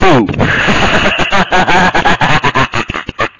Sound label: boo